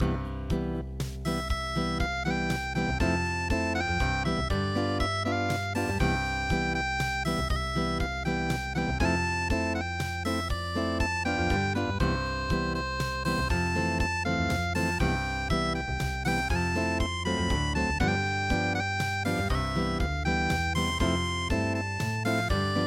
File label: unpluged, midi